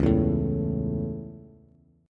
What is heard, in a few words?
C sharp Minor Sharp 5th, Bass; strummed; chord; x,4,2,2; JGuitar